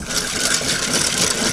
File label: EGG BEATER; Greytsounds; HOUSEHOLD FX